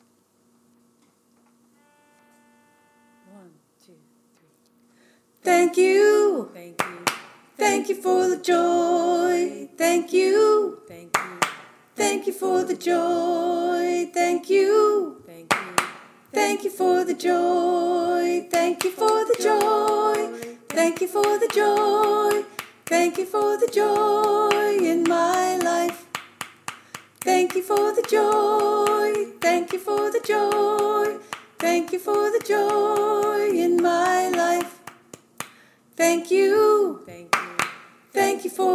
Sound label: soprano; The Freedom Choir